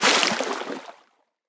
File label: pop out of water; ice age scrats nutty adventure